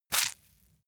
leather impact mud medium squeeze

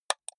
computer mouse left click single hard

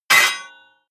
hit metal sword large

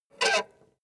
treasure handle squeak

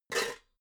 pots clank soft

